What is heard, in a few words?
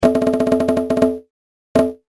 Djembe; Go-Rock Squad; Ranger